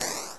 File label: fire